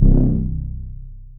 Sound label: bass; teen teen